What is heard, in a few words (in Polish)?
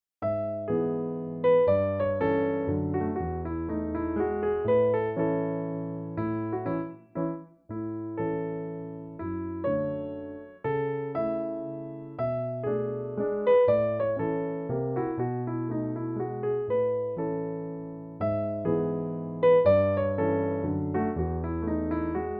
mazur; chlopickiego